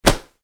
Foley Towel Snap; Snaps; Objects

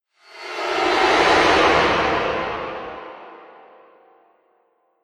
Ghost, horror sound effect

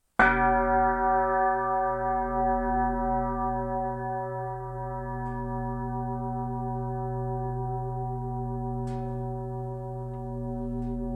Singing Bowl; Singing Bowl acquired in Nepal very rare bowl - ancient; Bells; Musical; Tom Hutchings

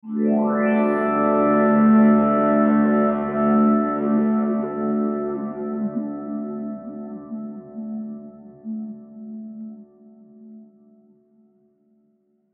Logo Vibraphone Ascend Wah; Sonic Logo Sound Effects; Production Element Imaging Element Accent Transition

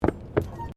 Tram Horse Drawn Step Wooden Bridge; Horse drawn tramcar; Carriage Sound Effects; Carriages (Sound Effects:Vehicles)

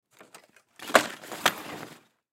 Hockey Helmet Bag, Hockey Helmet Put In Bag; SPORTS; Blastwave

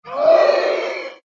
horror monster scream; Monster / Creature Scream 4; McKinney Sound

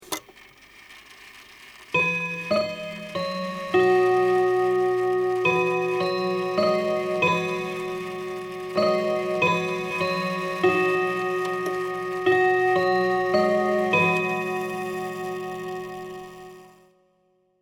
Old Westminster chime clock; Chimes; Multimedia

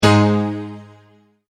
multimedia tone exclamation; McKinney Sound

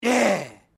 MALE EXCLAIM; Male Vocal sounds; Exclamation Sound Effects; Exclamations (Sound Effects:Voice)

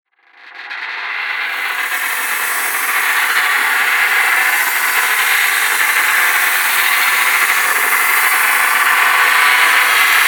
Frightening Drone; Scary Soundscape Sound Effects; Horror Sound Effect

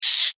Beetle, Scream, Vocalization, Hiss, Insect, Bug; Sound Effects:Animals:Insects; Blastwave

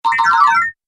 Health Powerup, video game

